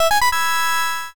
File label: quest clear